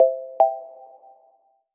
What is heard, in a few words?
noti; Groupcall